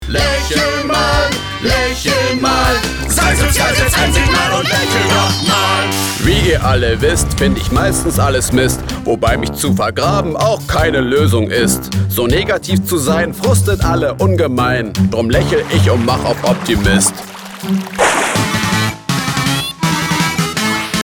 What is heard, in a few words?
Song; Uncle Grandpa